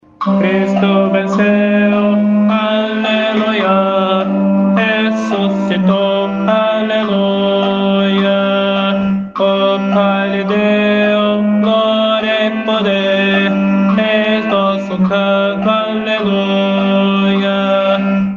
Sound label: tenor